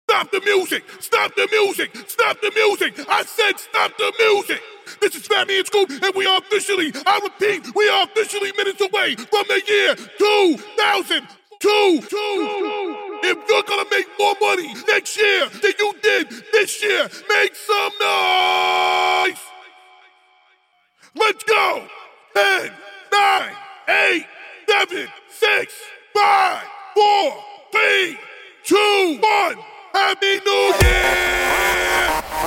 Acapella Intro, 125 Bpm; NYE; CleanDirty

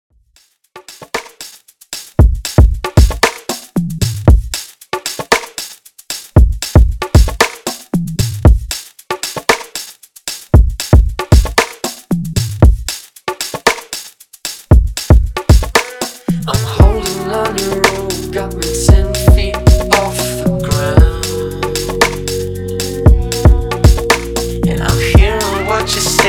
Redrum 115 Bpm

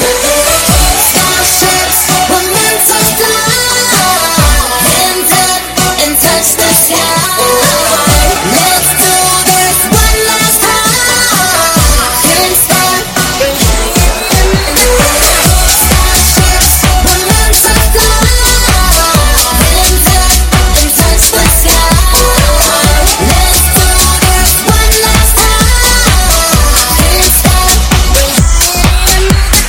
130bpm Bm

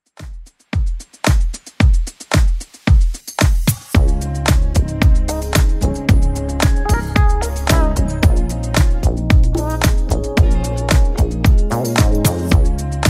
80s Club Edit 112bpm

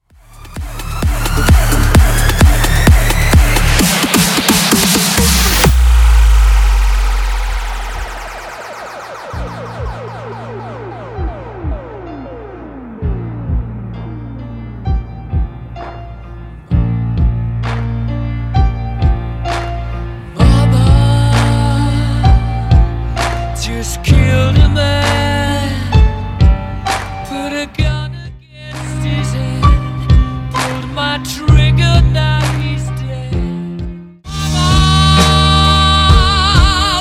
Bootleg Transition 130 138bpm

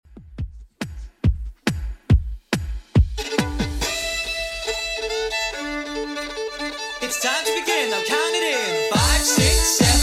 90s Redrum 140bpm

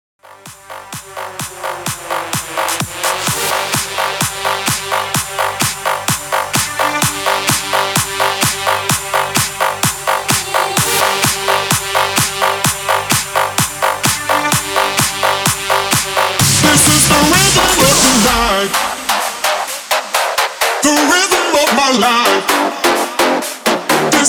BOUNCE 128 BPM

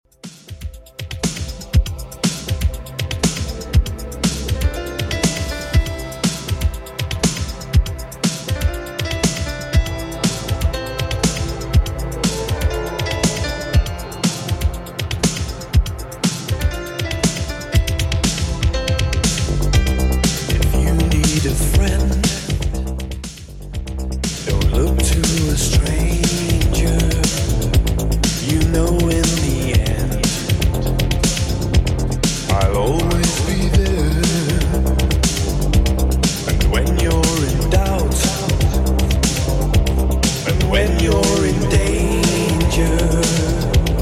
80s Redrum 120bpm

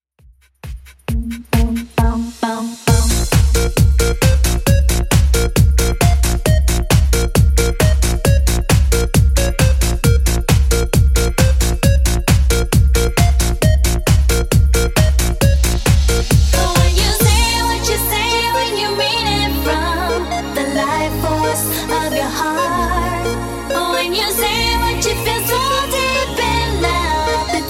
90s Redrum 134bpm

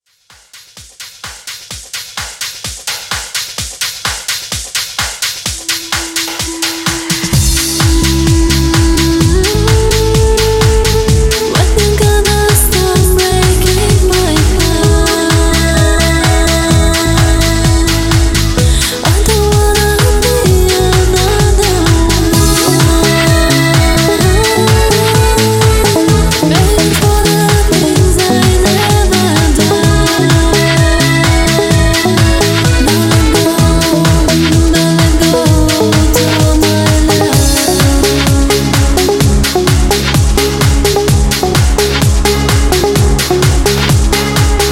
Dance Mixshow 128 Bpm

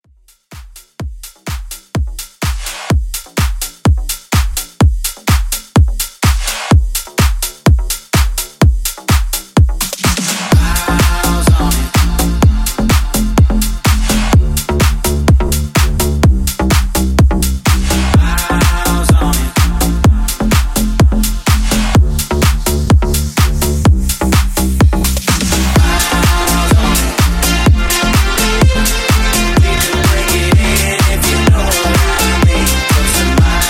Club Redrum, 126 Bpm

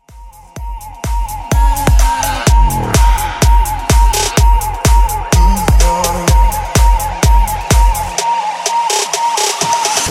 Bass House Banger

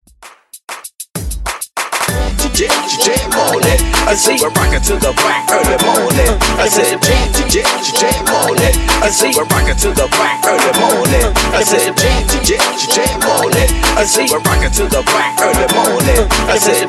ReDrum Hype Mixshow 97 BPM

